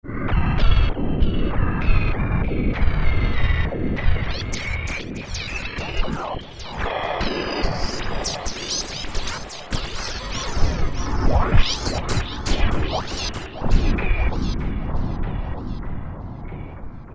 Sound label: Roland JP-8000, Intermittent; Factory Patches